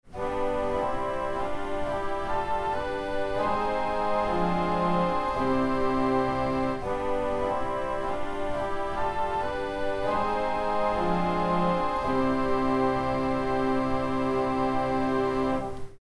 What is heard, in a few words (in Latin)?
Unda maris